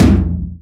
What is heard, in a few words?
Tom 80s Space Craft; Drums